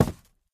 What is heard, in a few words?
phys cube, physics